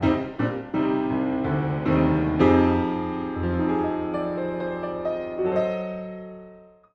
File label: Piano Spaced Pair Omni; Recording Mistakes